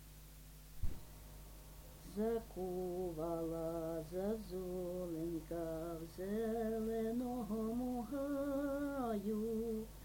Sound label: zakuvala zazulenka